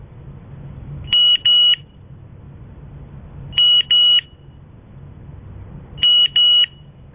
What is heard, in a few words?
double high falling to low volume